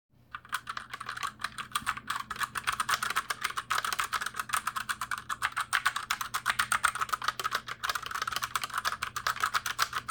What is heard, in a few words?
sven kb g9200